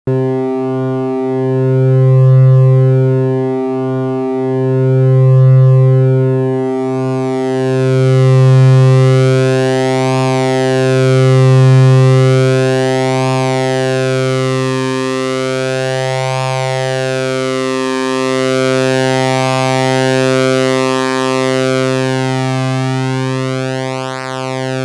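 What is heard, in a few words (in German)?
Pro 3 Überblendung von Dreieck zu Rechteck Shape Mod; Sequential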